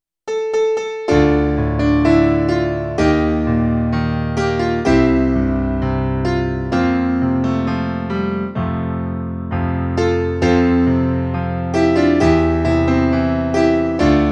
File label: Piano Acc; LKY School Choir 梁季彜合唱團